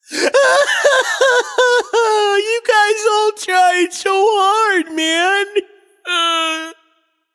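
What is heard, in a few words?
nonverbal boohoo; smite; voicepack; merlin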